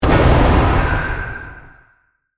Hit To Metal Scrape; Production Element, Imaging Element, Accent, Transition; BlastWave

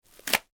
toilet paper rip; Toilet Paper Ripping / Tearing From Roll; foley; Objects; McKinney Sound

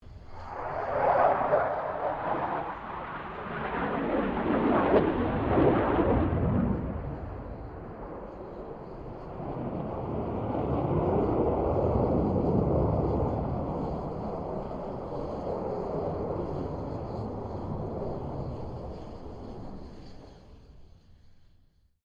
AIRCRAFT FA-18 HORNET PASS

